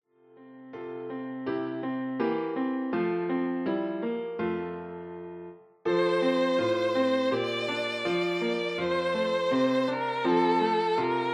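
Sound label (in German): O du froehliche, Violine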